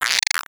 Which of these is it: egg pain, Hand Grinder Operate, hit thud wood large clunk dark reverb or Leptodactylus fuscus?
egg pain